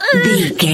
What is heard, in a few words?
voice female hurt pain mild